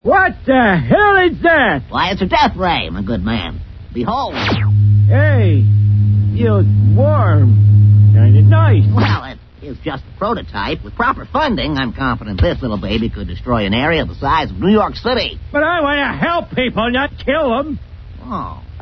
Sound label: deathray; Simpsons; Frink